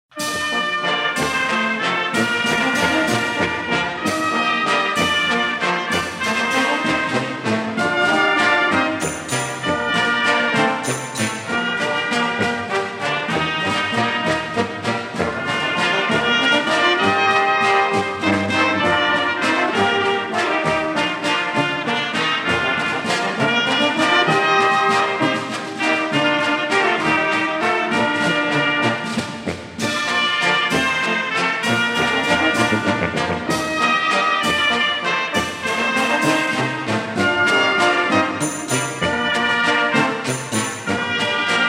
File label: Vals